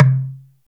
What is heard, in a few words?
LOG, LOG DRUMS; Heart of Africa